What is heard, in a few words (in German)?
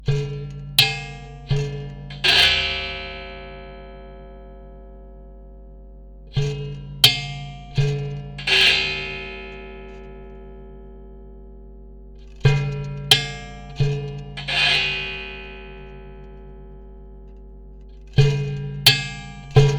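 Soundbox Mk2 Federn gezupft geschlagen gestrichen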